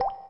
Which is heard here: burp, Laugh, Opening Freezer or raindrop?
raindrop